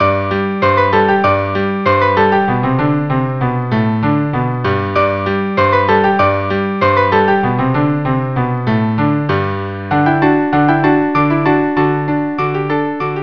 Musette 3 for 4

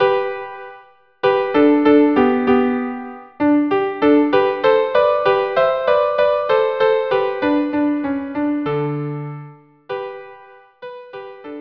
Larghetto viola duo

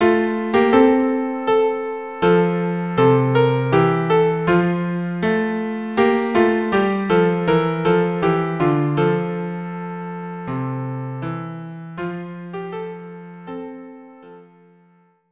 violin viola duo; traditional Jewish wedding song; Violin and Viola; Mi Bon Siach (violin viola duo)

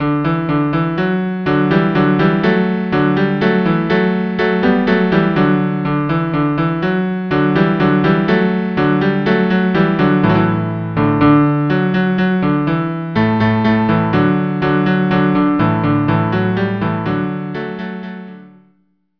cello cello Chaconne; Corette

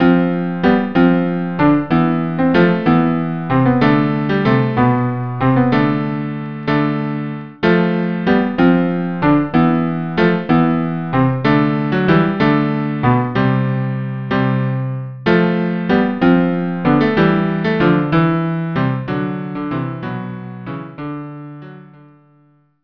estampie cello duo

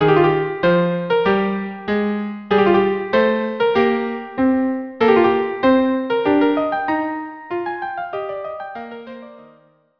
March violin viola; Smuglers; carmen

